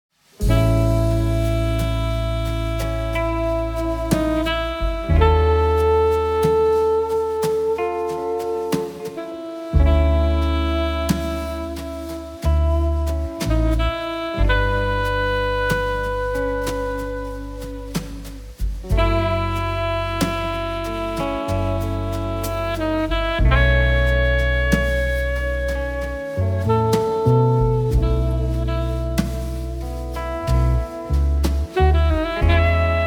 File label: Ring Ballad